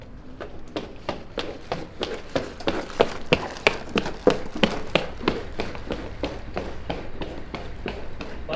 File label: Woman Running On Pavement Passing By Hi heels Carrying Stuff